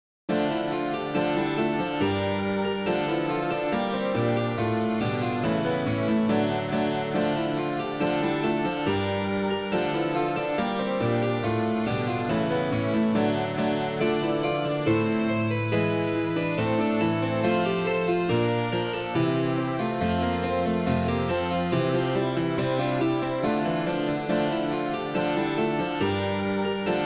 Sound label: tutti